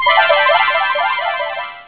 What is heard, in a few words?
Hit; Realmz 5.1.6; Hit Effect 4